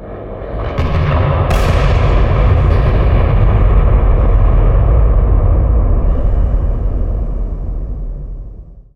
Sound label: thunder, halloween ambient; Garry's Mod; tf2